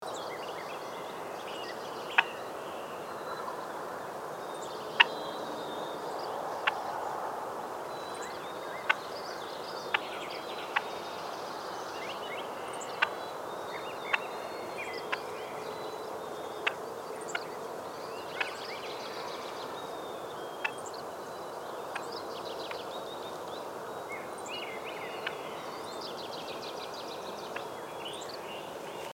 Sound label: Wild Turkey, Meleagris gallopavo; gamebirds